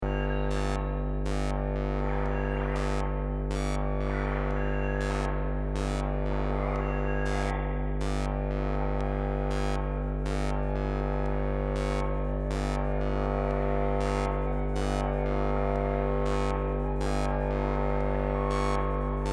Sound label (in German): syncflaeche filterfahrt; sys700